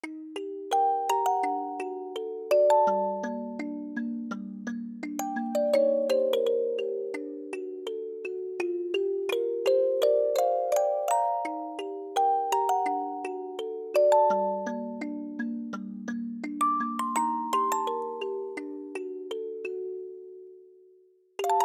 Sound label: (Music Box)